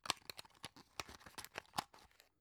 drug close soft; berufe; arzt

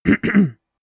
harrumph excuse me; soundpool

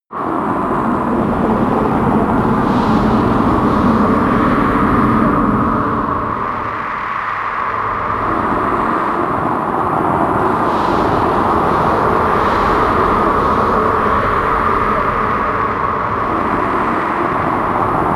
tornado, music elements

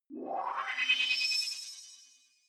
sci-fi transmission incoming; swooshes-swishes

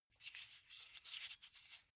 car flip key handling long

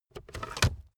car backseat table fold in fast hard

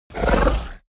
fishman snort short; kreaturen

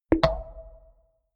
sci-fi bleep; maschinen; signale; soundpool

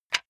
wood board small drop; objekte; holz

